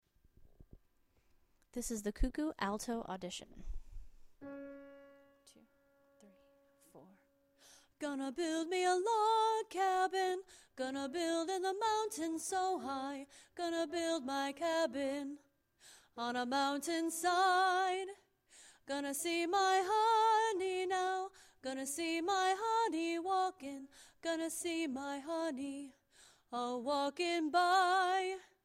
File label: cuckoo alto